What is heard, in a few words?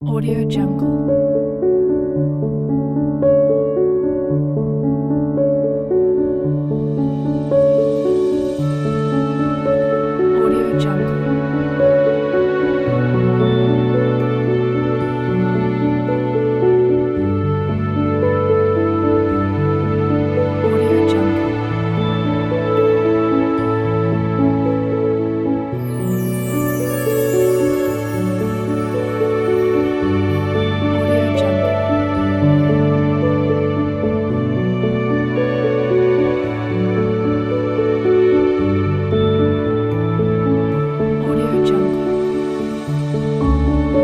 relaxed day